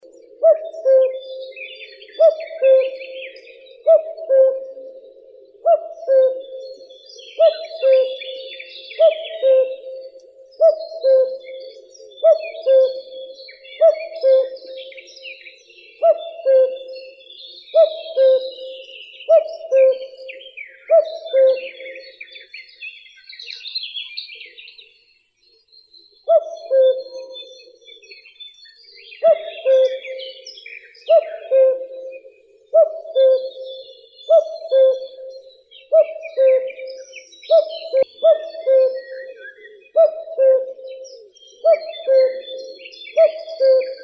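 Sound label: common cuckoo, Cuculus canorus; kukushka obiknovennaya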